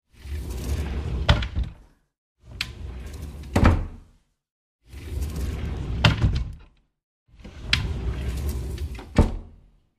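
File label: Door Sliding Open Close, cabinets & cupboards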